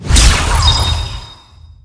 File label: staff change, Magic; Weapons; Guns